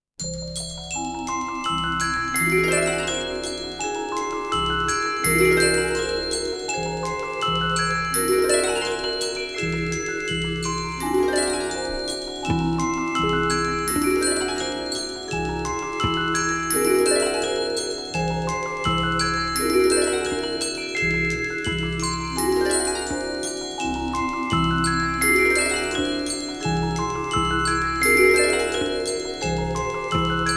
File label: shimmering percussion